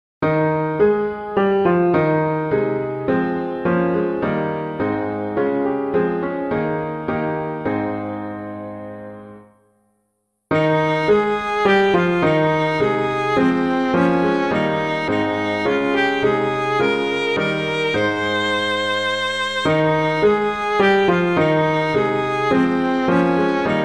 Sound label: Two Noble Saints Both Rooted, ELLACOMBE, piano, hymn